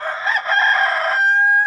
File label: chicken; EnvSound